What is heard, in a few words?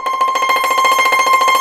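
YANGCHIN tremolo; INIS - Opium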